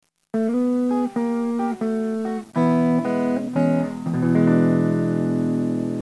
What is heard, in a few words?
electric GUITARS